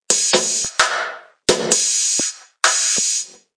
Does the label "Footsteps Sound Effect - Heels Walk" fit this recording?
No